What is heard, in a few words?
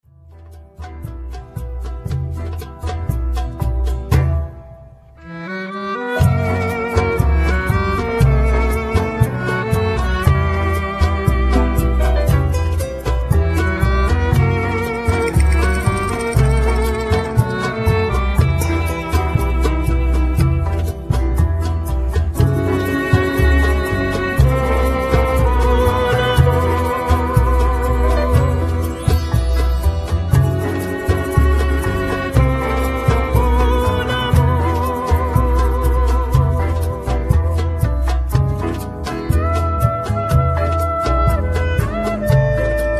tango